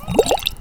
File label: bubbles; Skippy Fish